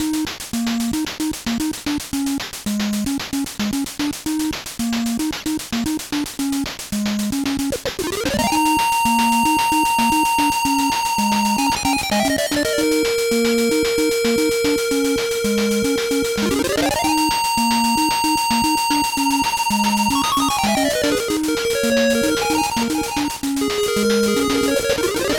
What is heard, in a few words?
Bonus; Legendary Wings; NES